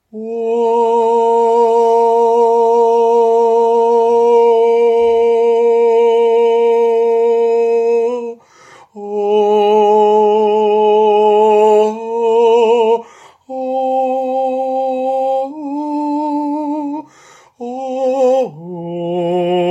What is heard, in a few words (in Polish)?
TENOR